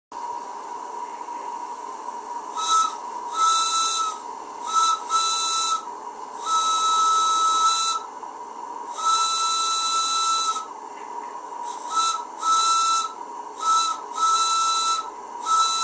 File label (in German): grosse Pfeife 8mm; modellbau pfeifen; Neumann